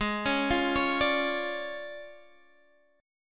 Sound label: D Minor Major 7th Flat 5th, Ab